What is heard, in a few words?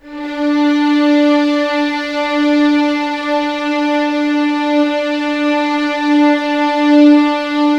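D4 legato violins; East West; The Ultimate String Collection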